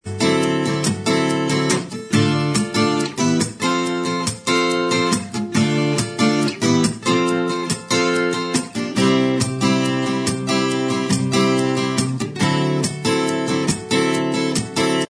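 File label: acoustic version instrumental track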